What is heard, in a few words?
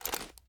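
assault rifle reload start; gmod; viper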